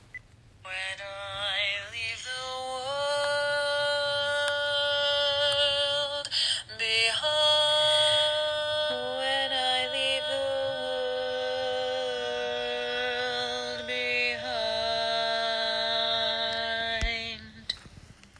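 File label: baritone tag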